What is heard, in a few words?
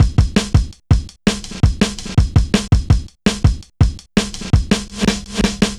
Persistent, Jungle, Drum Loops 165.5